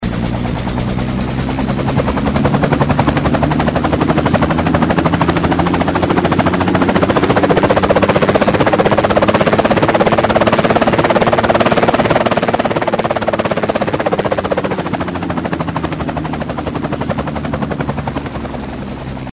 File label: Bell 500 Helicopter